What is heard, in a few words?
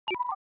Sci fi atmosphere unauthorized access single alert